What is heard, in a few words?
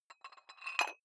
dumbbell put on rattling big weight soft, fitnessstudio